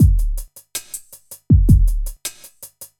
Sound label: DRUMLOOP AMBNT 160; eJay; Ambient